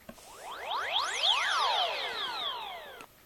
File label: samsung sghe700 ringtone